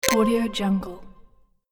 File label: button aura press